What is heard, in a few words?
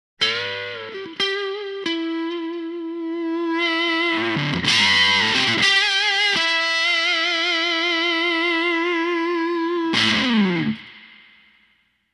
roll off, vibrato pedal; bigfootfx vibrato pedal